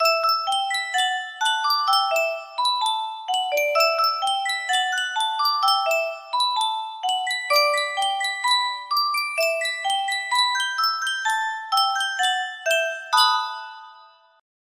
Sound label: Sankyo Music Box, music box melody